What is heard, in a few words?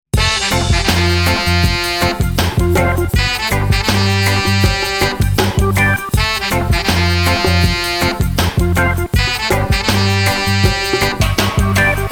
FLUTE JAM